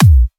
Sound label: 3xosc Kick